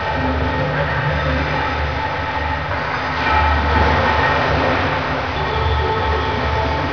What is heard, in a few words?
outdoor, air exchange; hl2